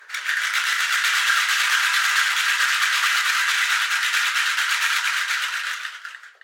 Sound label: Bean Belt Dense Resonant Shake